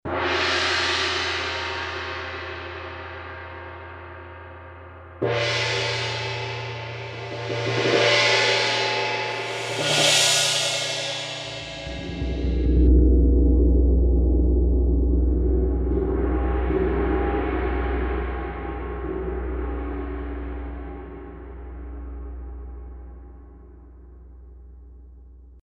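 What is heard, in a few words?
Cymbal Tam Tams; Roland L-CDX-04 Strings And Orchestral Percussion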